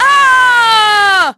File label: FALL, VOICE; Pulse Racer